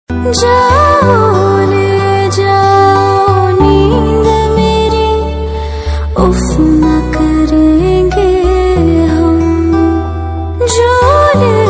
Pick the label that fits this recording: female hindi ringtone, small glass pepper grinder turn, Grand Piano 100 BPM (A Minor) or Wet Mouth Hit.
female hindi ringtone